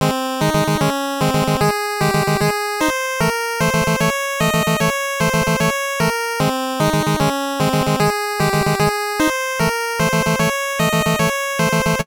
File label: Dungeon; Deadly Towers; NES